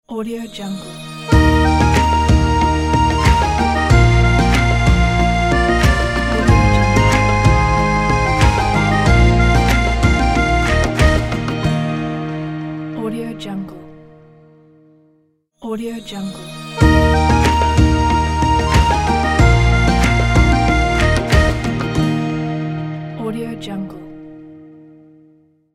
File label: ramadan logo